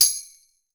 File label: TAMBOURINES, Percussion